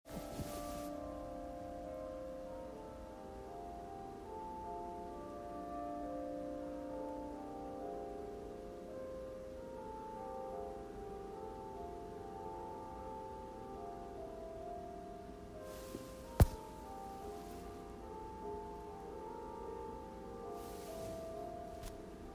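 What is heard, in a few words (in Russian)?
рождественские церковные колокола; rozhdestvenskie cerkovnie kolokola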